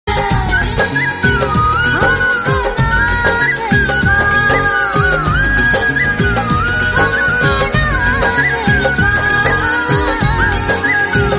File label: instrumental